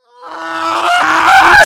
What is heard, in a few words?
scream fall; tf2; demoman